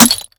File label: tile impact, Bullet Impact; Dystopia; physics